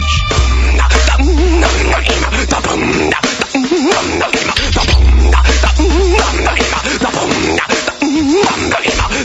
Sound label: Grunge Commercial; WFAN